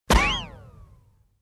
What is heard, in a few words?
Wild West Revolver shot